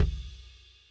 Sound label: Kick In